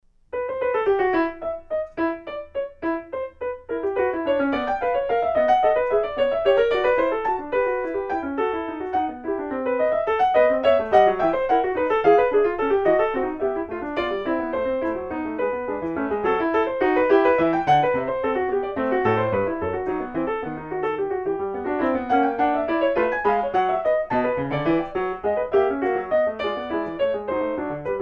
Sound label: Bach BWV 810.3 Gigue English Suite 5 in E